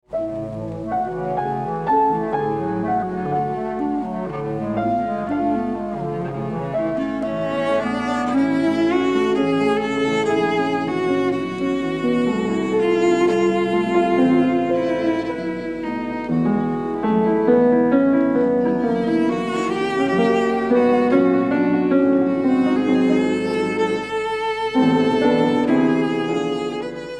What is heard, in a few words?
Trio in a for Clarinet Cello and Piano, Adagio; Brahms; Op. 114